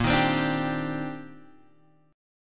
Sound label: C Minor 9th Bb down Guitar Standard; strummed; JGuitar